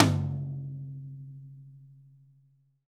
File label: tom, drum kit